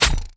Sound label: Thow Skin